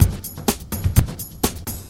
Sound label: Drum Loops